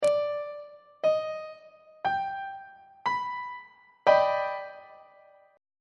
d sharp augmented major 7th 3rd inversion on treble clef